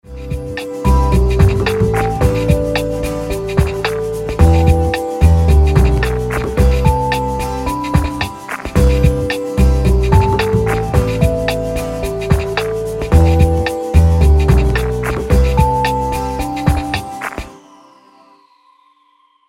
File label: Bass EQ Komp Inflator Hall Playback; Pearlcoder